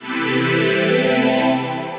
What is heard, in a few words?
pad, synth pad; Oxygen Pad; Ableton; Beat Tools